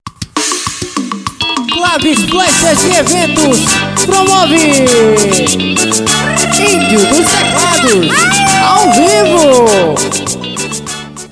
teclados

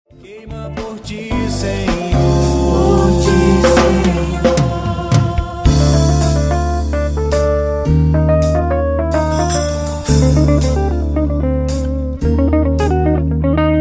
solo contrabaixo

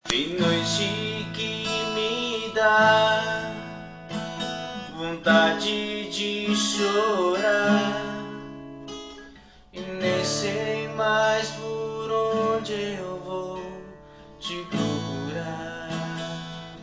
forro

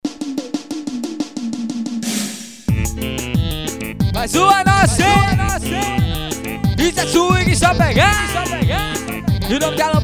swing

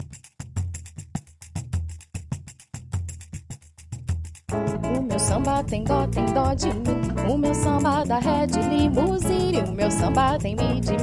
meu samba mix

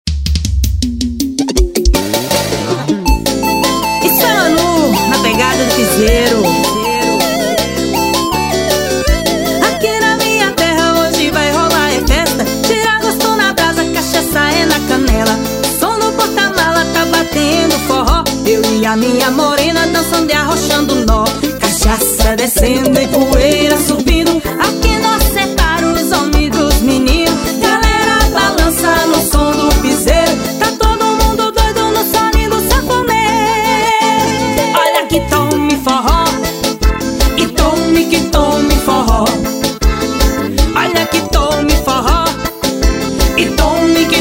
piseiro forró

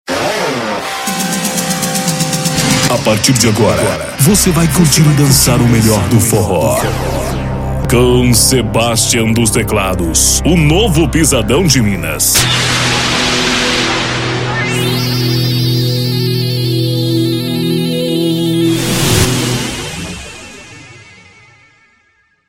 abertura

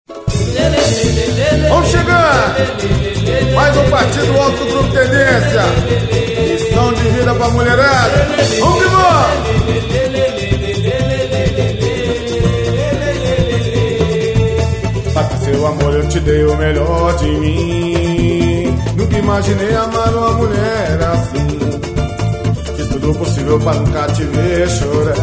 samba

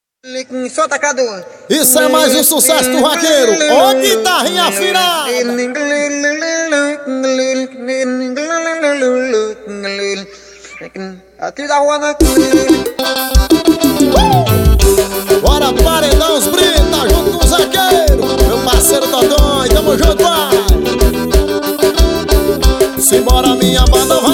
guitarrinha humana

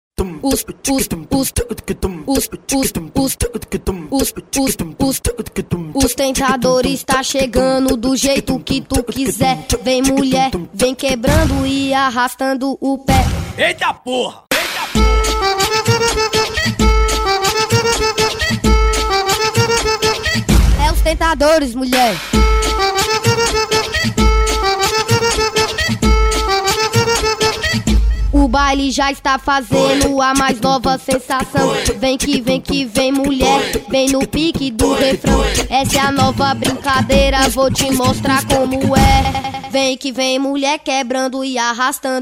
funk

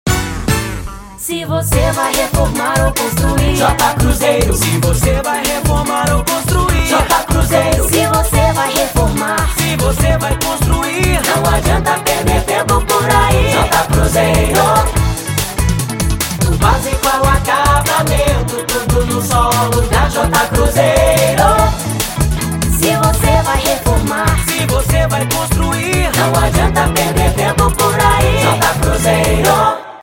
jingles; jota cruzeiro

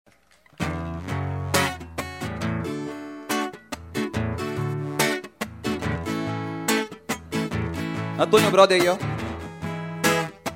reggae

